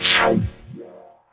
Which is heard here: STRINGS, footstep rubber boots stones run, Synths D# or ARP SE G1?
Synths D#